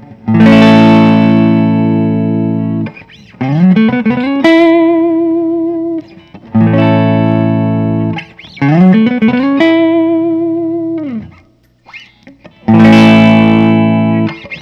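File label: Gibson custom chambered 58 Les Paul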